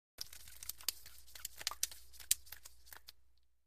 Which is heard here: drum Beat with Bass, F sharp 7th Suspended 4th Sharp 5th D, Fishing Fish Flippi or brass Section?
Fishing Fish Flippi